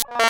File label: Buzz Thing